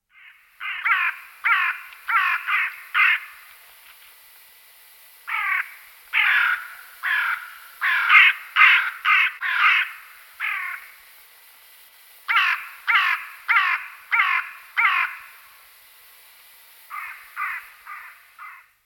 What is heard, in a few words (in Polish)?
wrona